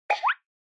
Motion Eating

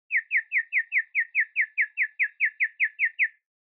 Motion Dizzy Slow Mid

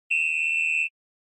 SNES Soccer Whistle

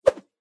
Motion Swish

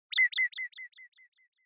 Short Accent High Delay

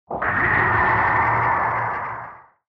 Retro Anime Accent Low Short

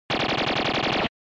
SNES Shooter Shoot

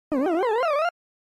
Arcade General Pitch

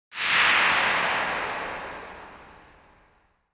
Noise Sweep High Long

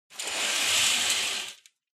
Curtain

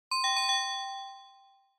Quiz Ding Dong Short

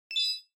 Short Accent High

